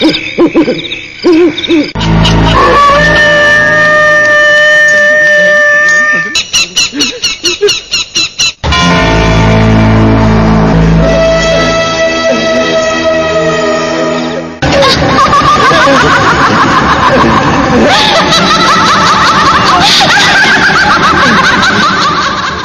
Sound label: horror ringtone; ringtones